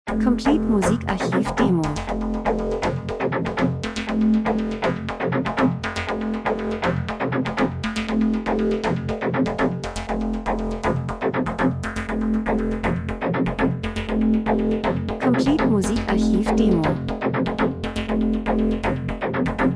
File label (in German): drums, rhythmus; Variation Bass Hektik Spannung Eile Urban unangenehm Sequenz gleichförmig monoton Verfolgung TV Krimi Spielfilm Dokumentation